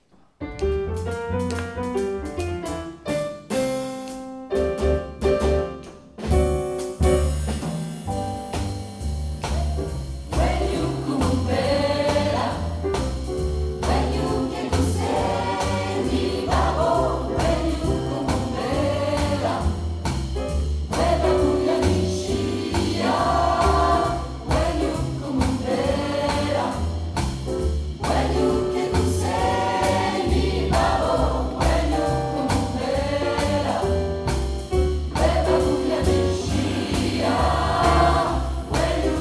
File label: Choo Choo Train; Voice Gang; 16 ème Festival DES VOIX SOUS LES PLATANES